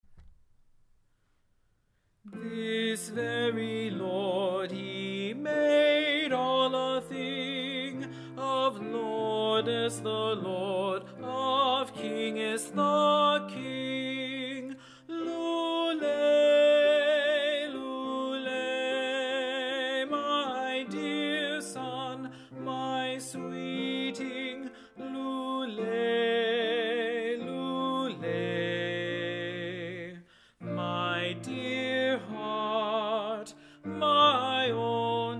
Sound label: Tenor; I Saw a Maiden, E. Pettman